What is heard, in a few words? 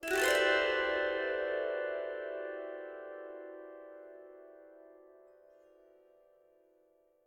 Combat Zither Cluster; curious expedition 2 macos ps4 switch windows xbox one xbox series xs gamerip 2021